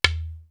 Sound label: WATER DRM 3 HD; East West; Global Sounds